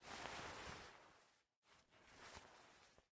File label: Move Use Big Key; MiSide